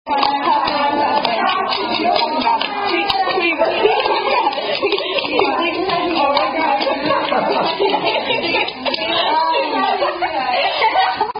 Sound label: Hand Clapping Game; Zipadedoda Productions